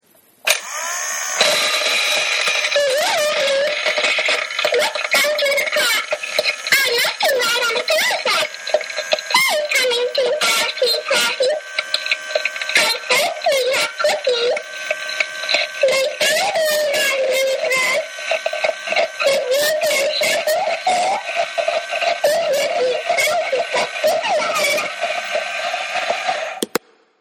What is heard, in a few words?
chatterbox talking